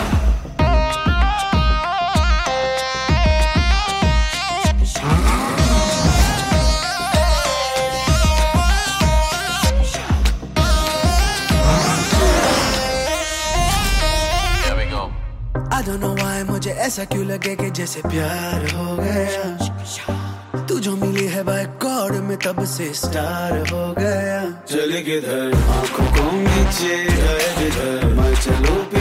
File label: ringtone bollywood ringtones